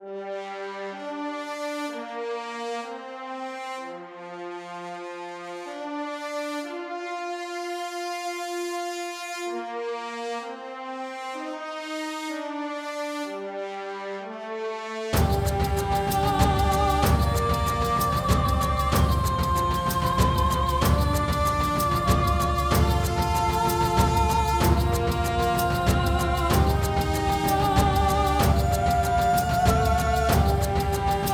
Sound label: nostrings cinematic; by burak sound bank; tension gerilim vol 52